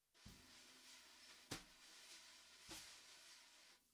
SNR

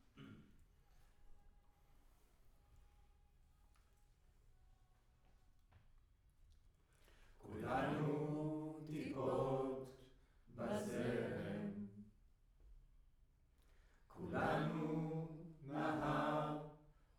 Room, Choir

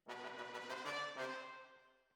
Tromb ASS

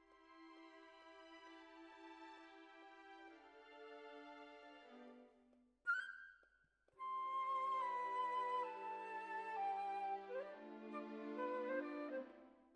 Clarinet

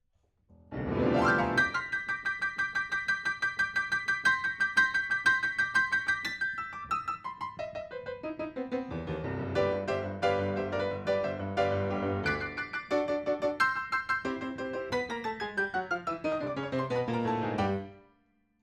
PIANO, RNR for piano

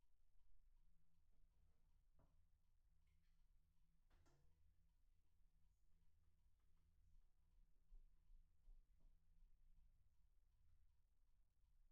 Room, 160 BPM

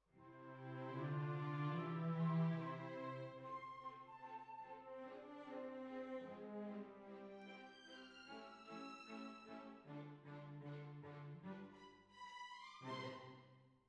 Cbasses

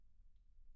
Children Choir; Studio Bekol Children Choir

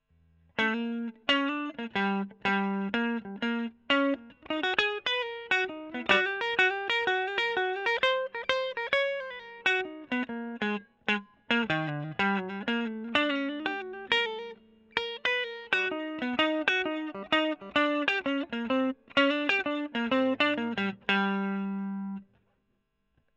E.GTR Gefell Solo

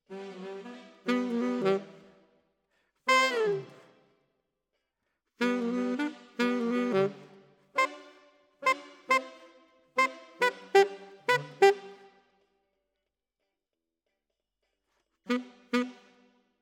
Alto, RNR for piano

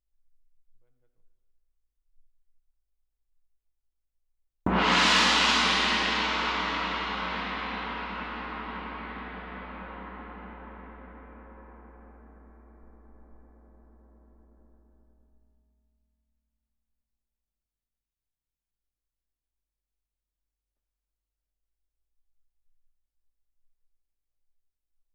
Tam-Tam Main

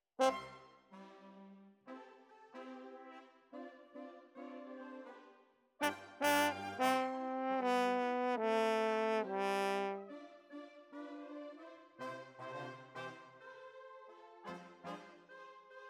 Horns

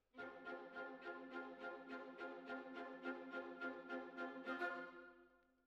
Cellos Over

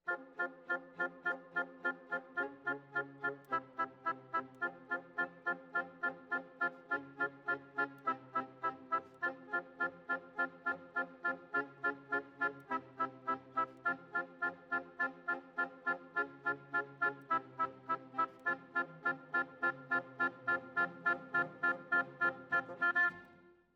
Oboe

